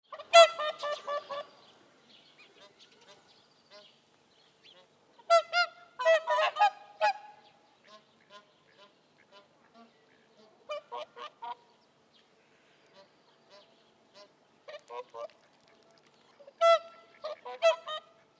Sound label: Cygnus cygnus call Rufe eines Paares